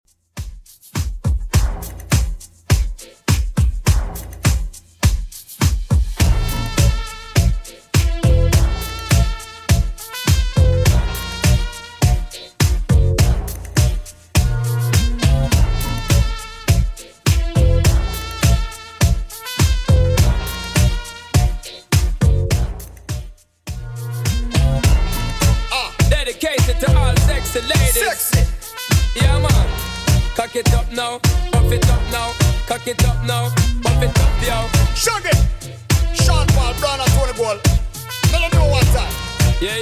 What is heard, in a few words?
2ks Redrum 103bpm; remixplanet